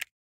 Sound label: button Hover Away; twin spin mw